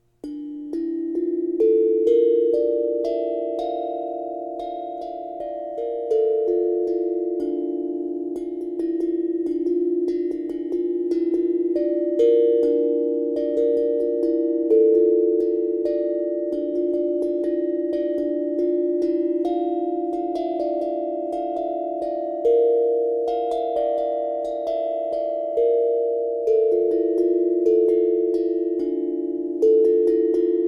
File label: hapi mini d ake